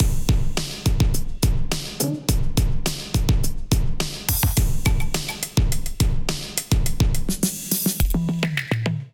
Big Sun Kit groove 105 bpm; MIDI Clips; Ableton; Drive and Glow; Big Sun Kit groove 01

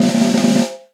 Snare Press Steely Tight; Snare Articulation; Drum Hits; Session Drums Studio; Standard Hits

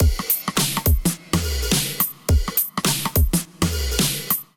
Kit 105 bpm, Drums; MIDI Clips; Harbour Kit; Drive and Glow; Ableton; Factory Packs

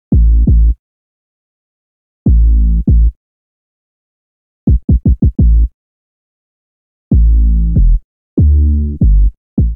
New Old School A Minor 83bpm, Bassline

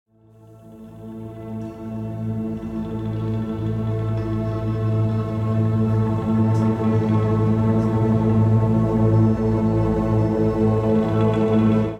Drone Am 80 Bpm; MIDI Clips; Instruments; Serpentine; Ableton; Drone Lab

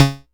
digital pitched, Kickbank; Ableton Foundation FreePacks

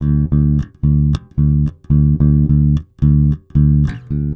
110 Pop Basic Bass D, E-Bass; Pop Rock

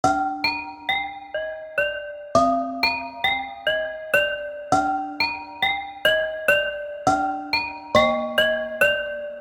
Ceramic Bowl Melody D Phrygian 102 bpm; MIDI Clips; Tonal; Ableton; Factory Packs; Lost and Found

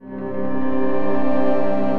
Aolian Pad, Ethereal Pads; Instruments; Ableton; Mood Reel

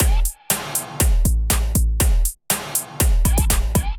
Kit; Drums; Miami Night; Ableton; Build and Drop

